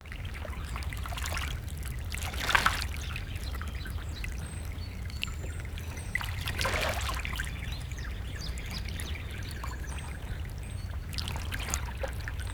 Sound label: soft gentle water ripples; bird chirps